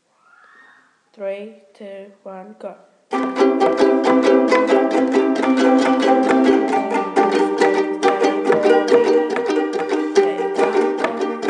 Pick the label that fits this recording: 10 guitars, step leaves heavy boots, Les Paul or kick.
10 guitars